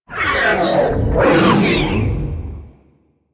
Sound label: Sentient Ship Attack Maneuver; Warframe; Enemy Ships; Space Fighter Sentient Coil Agent